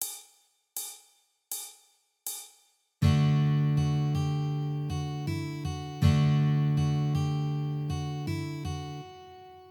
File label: Strum and Melody